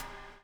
bubble; Spectrasonics - Backbeat

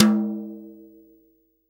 TOM, Real Drum Kit, SWING KIT

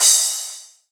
Crash, Drums Cymbal; House; Crashes; Best Service; House Definition VOL-1; Cymbal Stuff

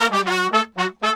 120, BRASS SAMBA; Best Service ProSamples vol.14; AKAI

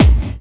KIT HOU; Best Service Dance Mega Drums

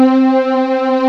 STRINGS, D - 70; Rubber Chicken Software Sample Bank

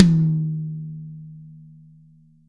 DRY KIT, Drums; Real Mega Drums

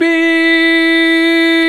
VOX, Male Scats

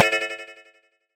fx alien mystery; neuro; Sinistarr; Synths and FX

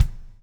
BLUES TK, Backbeat; Spectrasonics - Backbeat

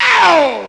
VOX; Zero G; Man Machine

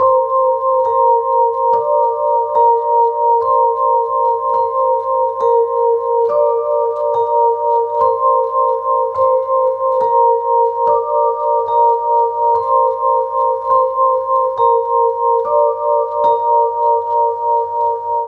105 Cmaj SUGARFUNK VIBES; 105 BPM

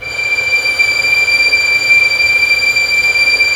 STR VLS, STRINGS; Roland - LA Composer vol. 1

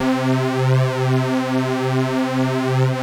PULZE FLANG, LEADS; Masterbits; Vintage Voltage

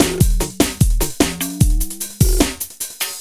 LOOP, Eurotech; Sounds Good

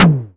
Fricke MFB712 TOM

